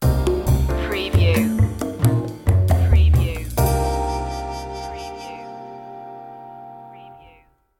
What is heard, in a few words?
jazzy opener